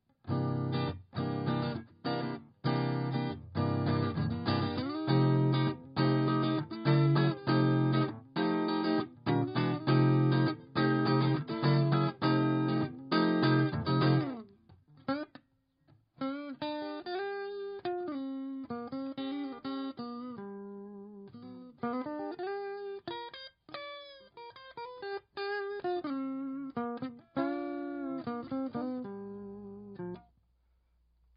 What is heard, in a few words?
flat n dry